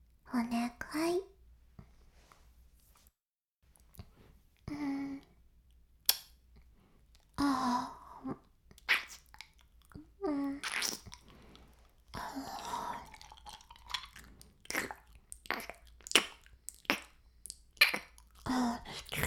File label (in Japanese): 耳舐め音声, ASMR; 白くて黒い魔性円環